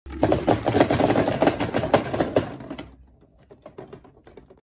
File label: plastic ball